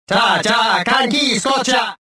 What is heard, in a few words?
anger